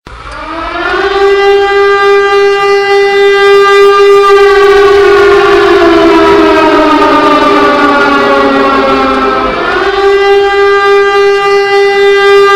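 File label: air raid siren; GO sirena; Vozdushnaya trevoga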